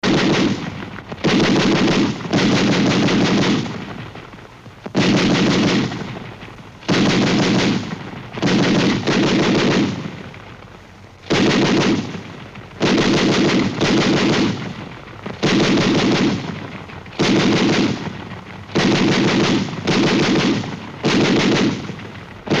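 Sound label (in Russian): большой пулемет тяжело строчит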